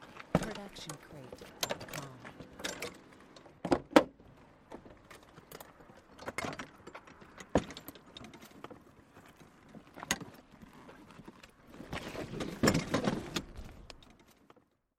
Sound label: putting golf bag inside car; soundscrate